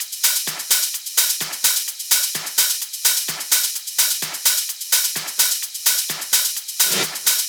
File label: 128BPM Classic Kit; VFH1 128BPM The Classic Kit